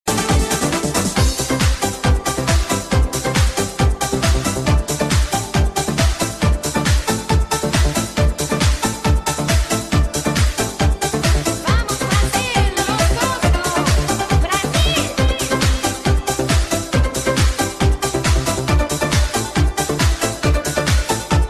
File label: brazil beat